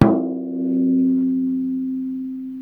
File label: tom tuning closed